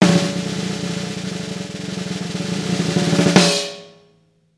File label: drum roll please classic reveal